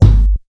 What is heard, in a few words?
kick g key; Duration 0:01; Samplerate 44100 Hz; drum single hits one shots